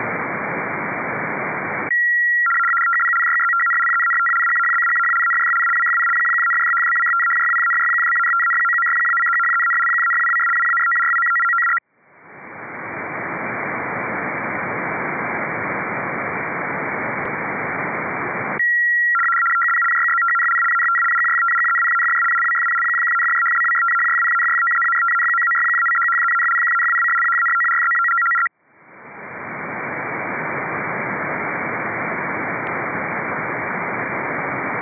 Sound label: rtty cw; icaro4